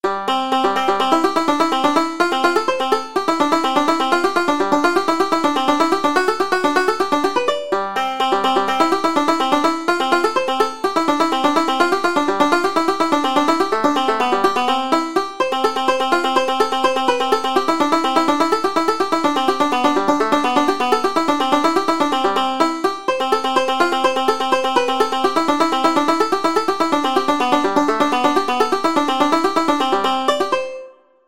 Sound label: banjo